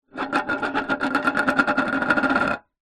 foley tin small metal empty down spin wood surface; zapsplat